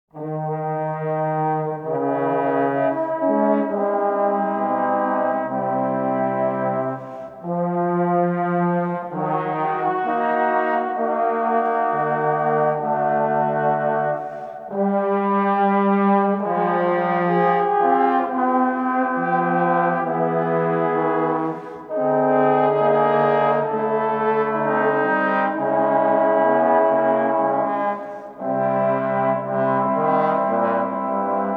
Suite for 4 Trombones in Quarter-Tone System - I. Maestoso